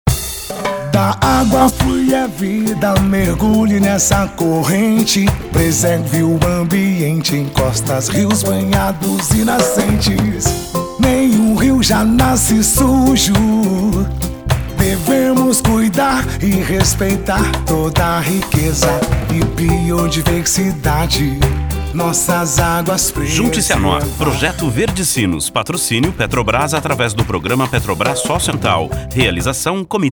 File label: Jingle; Projeto VerdeSinos